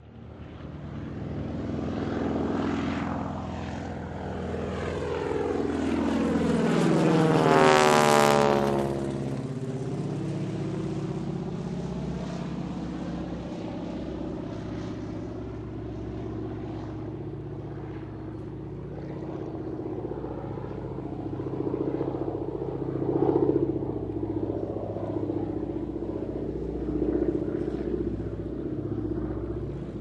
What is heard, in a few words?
Airport Bg W Propelle